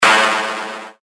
A, percussion; Voodoo; revealed percussion vol 2

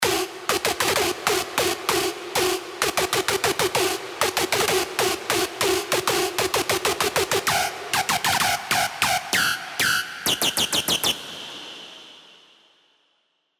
Screech; Screech God Is A Robot; Revealed Serum 2 Rave Asylum

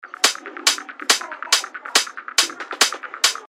140 BPM, techno loops; OkBoy; Revealed Techno Top Loops Vol. 9

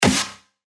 Snare Ghost; revealed claps snares vol 2

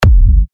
90 E, techno kicks; Saiyajin; Revealed Techno Kicks Vol. 10

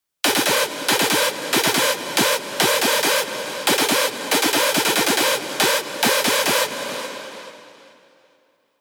screech; Bio Synthetica; Revealed Serum 2 Screech Pathogen

